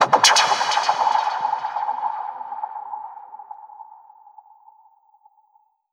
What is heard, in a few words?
FX Impact